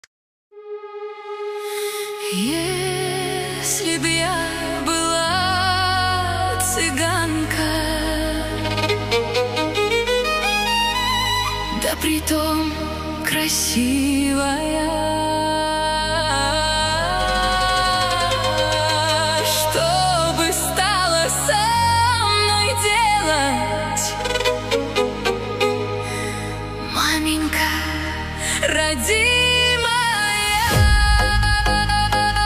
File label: bass boosted club mix